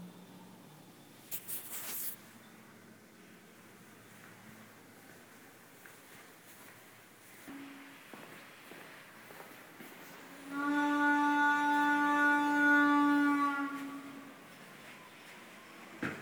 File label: Wind Howling Front Door; Yerkes